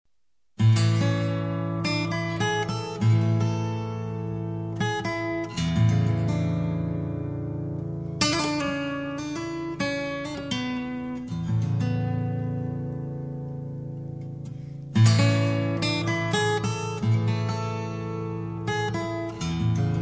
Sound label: acoustic